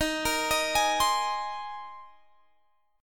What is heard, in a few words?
A sharp 7th Suspended 2nd Suspended 4th